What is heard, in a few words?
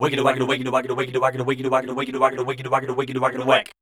SCAT C, Voice